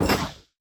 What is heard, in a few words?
Mobile Menu Portal Locked